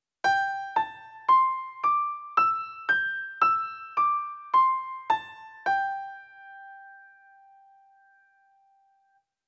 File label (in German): Modus-G der C-Dur Pentatonik